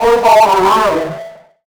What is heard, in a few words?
VOICE FX, Dance Vocals